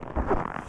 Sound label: after snow; Sid Meier's Civilization 4 Complete; Beyond the Sword; Units